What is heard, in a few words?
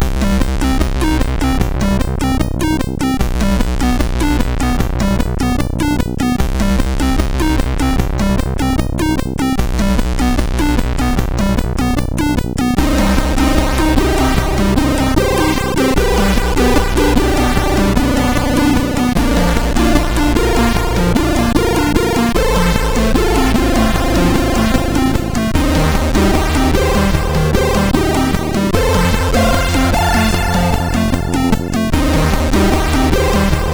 Chemtrails, MOS6581R2; sid